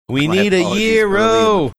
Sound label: singing we need a gyro